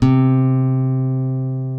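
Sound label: C2 FLAMENCO, FLAMENCO guitar; AKAI S6000 CD-ROM; WorldSounds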